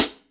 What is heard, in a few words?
HH, Drum Dry; East Collexion; Drum 1 Dry